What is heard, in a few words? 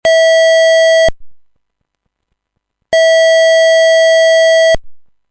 tone